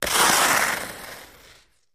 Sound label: Ice Skating Toepick, ice skating